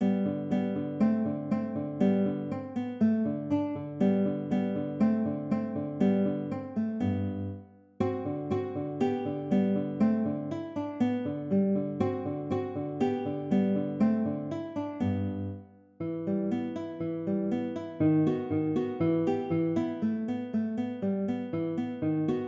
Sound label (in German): Studie, konzertgitarre; Carulli